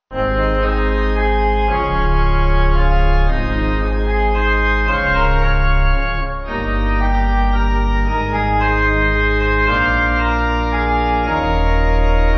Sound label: Wonderful Grace Pipe